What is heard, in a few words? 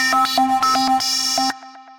Synth Rhythmic; Neon Flicker; Ableton; Glitch and Wash